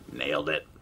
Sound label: Text Tone